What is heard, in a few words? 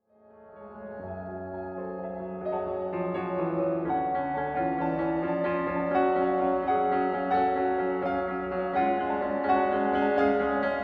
Pianos